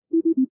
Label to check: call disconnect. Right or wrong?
Right